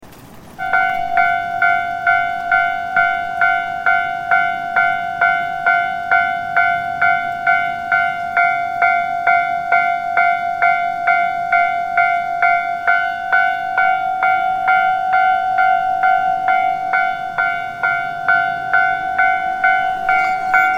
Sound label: toll bar